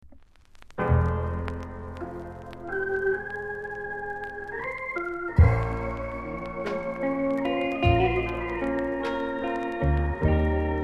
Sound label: ROCK STEADY; ska 2014